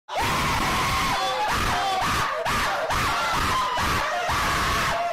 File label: very chaotic and unnecessary screaming